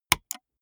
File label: gaming mouse left click single hard; maschinen; buero